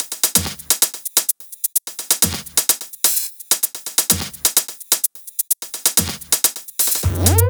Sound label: 128BPM Kit; Vengeance Samples; VFH1 128BPM Contradiction Kit